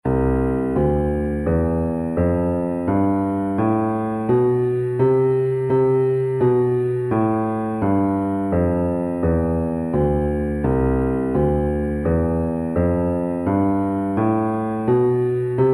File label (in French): gammes modes